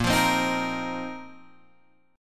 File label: Bb Suspended 2nd Suspended 4th Bb Guitar Open G; strummed; 8,10,10,8,11,8